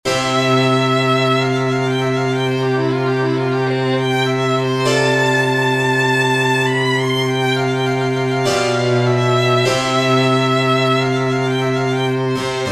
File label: sonata violin continuo adagio